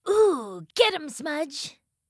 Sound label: taunt laser; BTDB2; ezili; catt